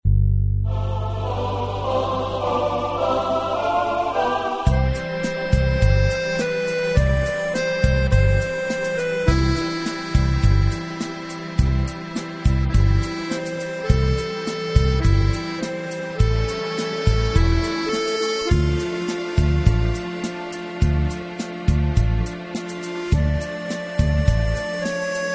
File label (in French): un p tit air d accordeon